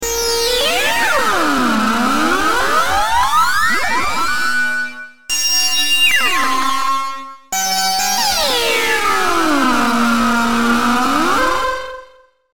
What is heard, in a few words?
Squeal Synth